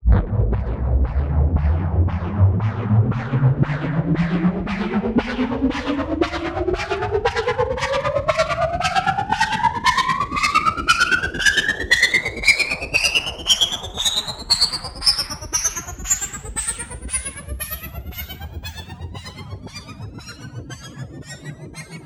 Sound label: Rises; neuro; Optiv & BTK